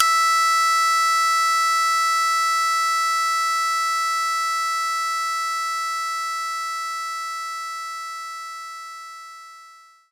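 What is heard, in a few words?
Shamisen F#2; knights try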